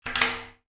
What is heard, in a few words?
Glass door opening